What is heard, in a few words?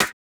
Snare Hit; big fish audio; Hip Hop Exotica; Gmin